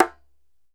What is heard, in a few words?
DJEMBE, African Perc